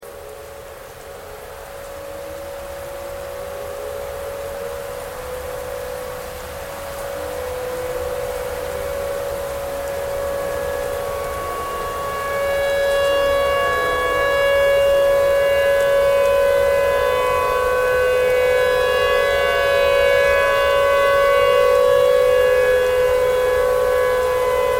tornado siren tornado siren thread noise

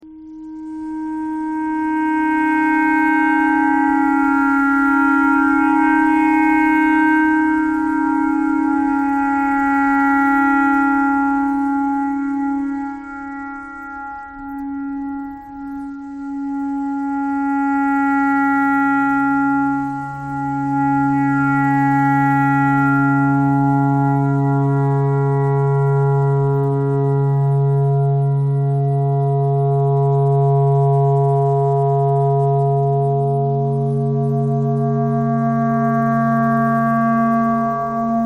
clarinet clarinet pentatonic

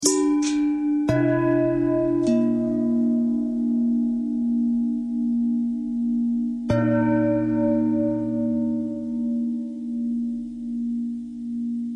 bell long bell thread noise, bells

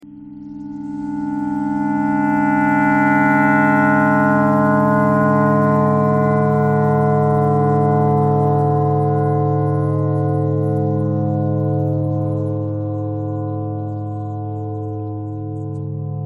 clarinet thread noise

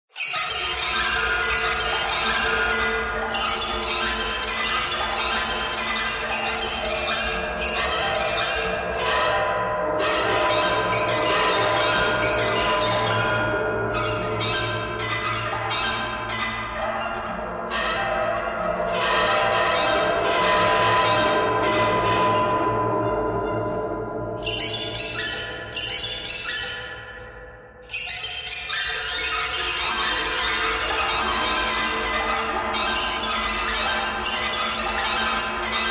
birds birds thread buzz

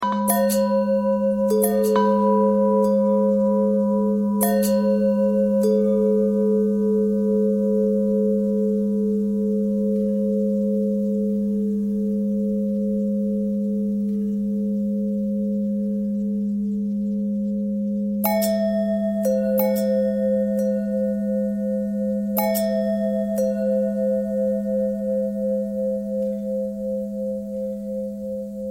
long bell bell thread pentatonic, bells

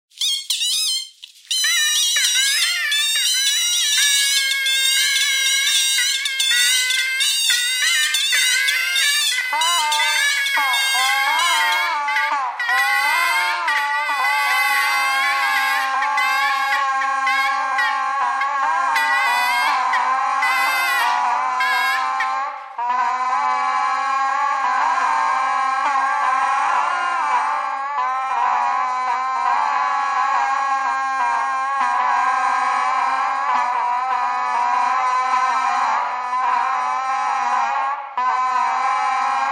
bagpipe radio bagpipe radio thread noise

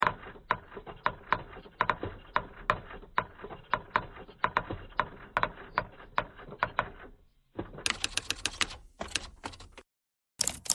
typewriter thread pentatonic

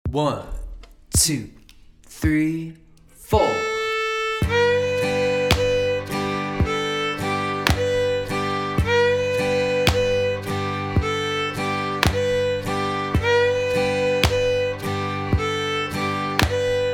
Sail Away Ladies basic A Part Bar; fiddle

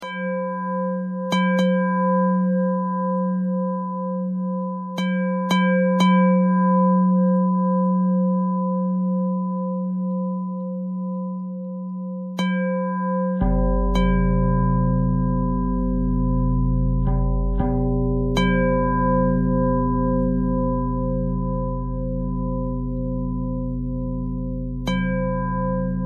bell bell thread simple, bells

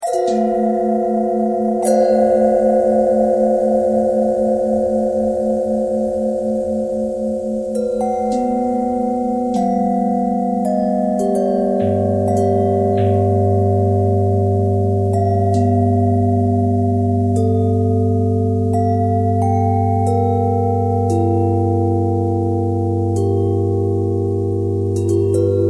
bell twist

